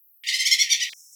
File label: growl